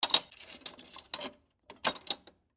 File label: Pay Phone Coin, Technology, Telephone, Coin, Return, Open, Remove, Coin, Pay, Phone; BlastWave